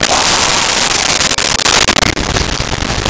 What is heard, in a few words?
LoFi Synthetic Lion Roar; Vintage Cartoon; Comedy Vocals; SONY PICTURES SOUND EFFECTS SERIES